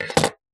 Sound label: wirecutter drop